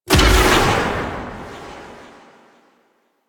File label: Blade Explosion; Warframe; Pax Duviricus